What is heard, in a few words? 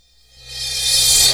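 crash reverse, Dance Mega Drums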